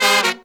Horns 132BPM; Zero-G; Phantom Horns

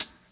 KIT, Dance Mega Drums, HIP; Best Service

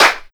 DRMS, Underground Hip Hop

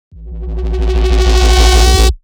FM Tone; neuro; BIG5AUDIO

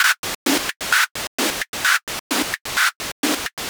Gated Seq Noise, Gate Filtered 130BPM; noise hiss crackle

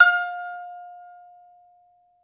BAGLAMA F0KR; E-MU Producer Series Vol. 7 – Old World Instruments

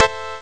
chord; Amiga Soundtracker Sample Packs

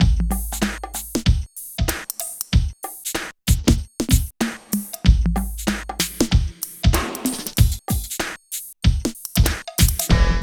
DRM LP; East West - Phat & Phunky CD2; 90's Sample CDs

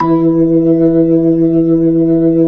B-3 Jazz; Keyboards of The 60's and 70's

